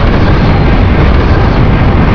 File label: elevator fall; svencoop